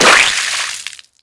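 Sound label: old grenade, guns; Digital Paintball 2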